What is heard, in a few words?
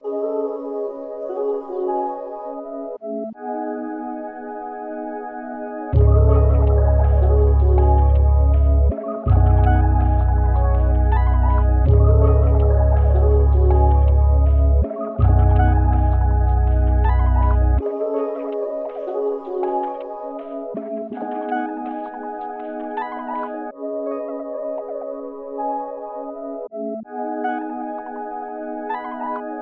162 BPM C# Min, Melodies; Cymatics; 2022 Melody Mystery Expansion